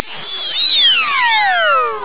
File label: scifi